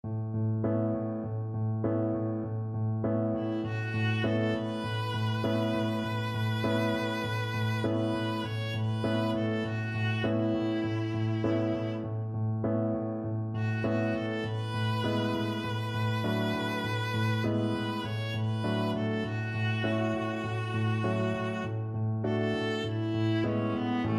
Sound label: viola